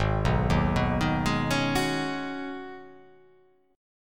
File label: G sharp Minor 11th G sharp, chord